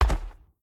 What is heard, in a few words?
foot step; smite; zhongkui